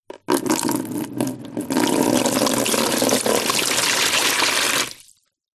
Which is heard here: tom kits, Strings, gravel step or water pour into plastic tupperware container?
water pour into plastic tupperware container